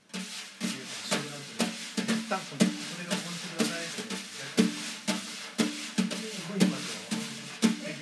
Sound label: jazz drum